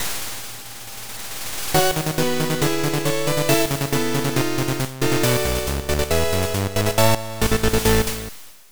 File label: Scene Clear; Gensou Tairiku Auleria; PC Engine Super CD-ROM2